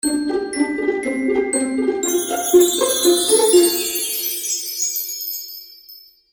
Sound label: Magical Music Finale, Cartoon Music Sound Effects; Twistedtracks